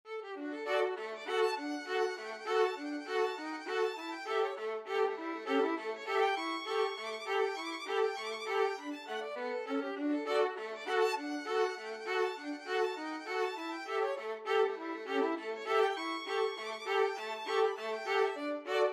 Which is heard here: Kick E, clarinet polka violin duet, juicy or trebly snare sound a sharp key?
clarinet polka violin duet